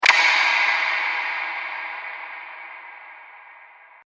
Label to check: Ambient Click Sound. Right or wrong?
Right